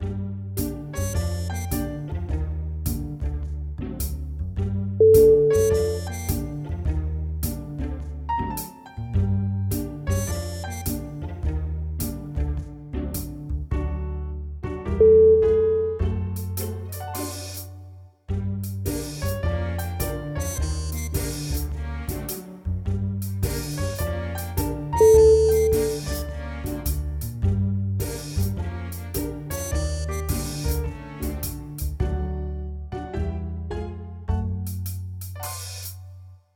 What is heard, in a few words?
fat cartoon jazz